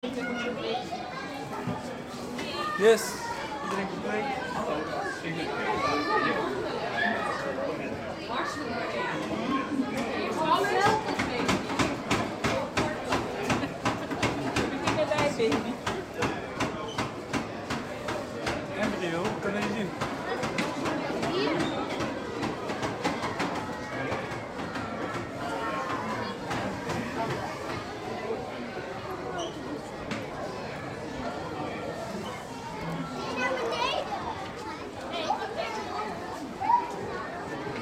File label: fieldrecording nemo collage